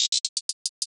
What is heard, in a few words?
Hihat Roll - 122 BPM; Drum Accents; Apples; Cymatics; GHOST (Beta Pack)